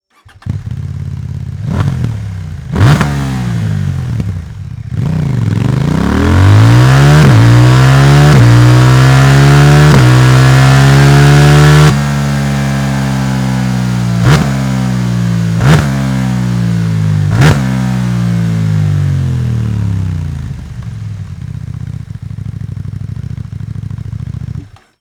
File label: Slip-On CF MOTO 800 NK; Akrapovic